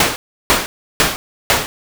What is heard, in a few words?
snow step